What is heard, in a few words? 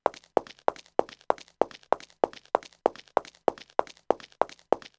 Footstep fast